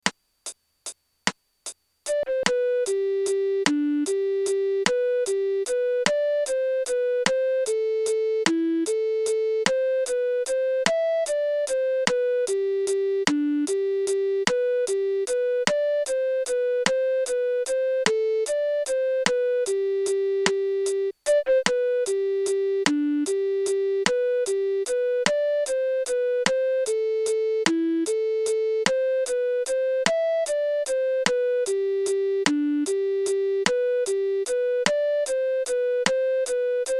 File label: Blue Melody Only